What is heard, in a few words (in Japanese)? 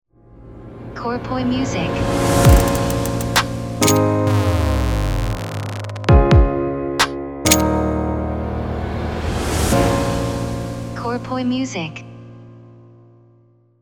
jingle ウォーター